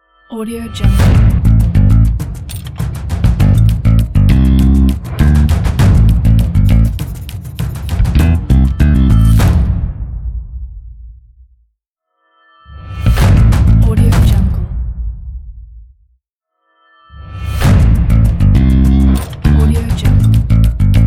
electric bass logo; exelaraudio

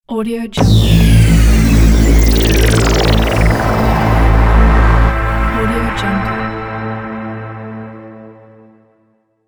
hitec logo

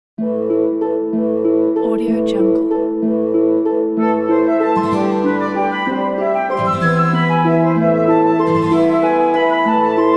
medieval minstrel

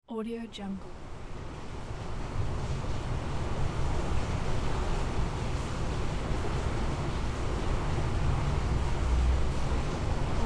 heavy rain storm ambience indoor

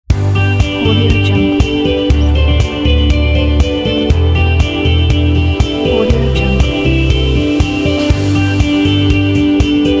corporate uplifting ident pack

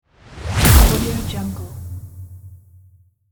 transition

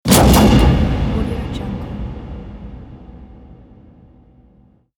mechanical heavy sound

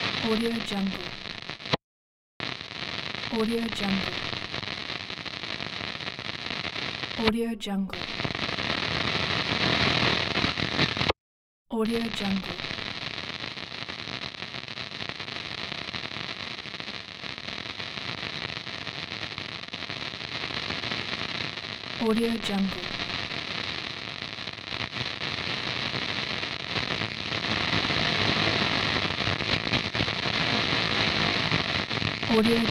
radio static glitches

